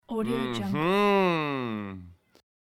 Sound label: male mhmm